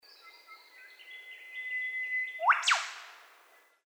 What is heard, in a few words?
bird lithgow call